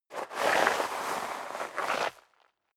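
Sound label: step dress boots gravel light slide long, footsteps; schritte; menschen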